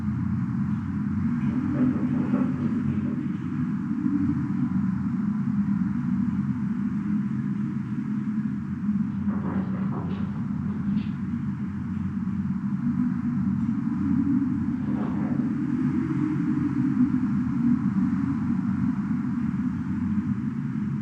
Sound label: Fort Corner Tower Interior Loop; Ambience